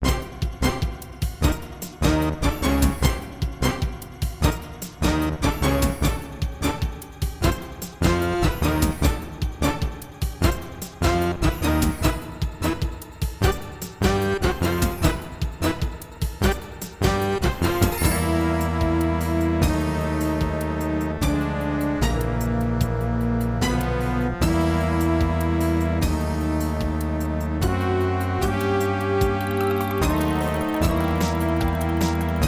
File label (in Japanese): WATERパイプ (MT-32版)